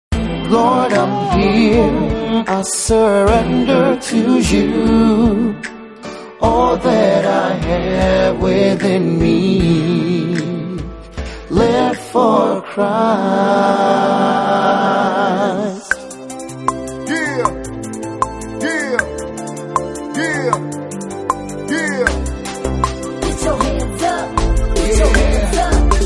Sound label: Rap Beat; L4C STUDIOS